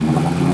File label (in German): kaputt; DEMOUK